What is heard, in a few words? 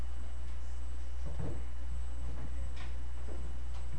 shake